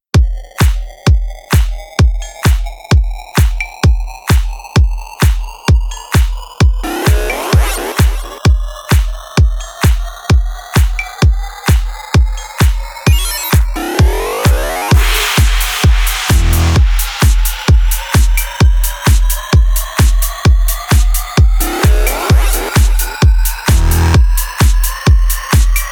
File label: Instrumental Mix; Carnival Of Animals